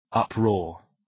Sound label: uproar